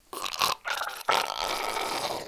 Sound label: idle, zombie; DayZ-Epoch; dayz sfx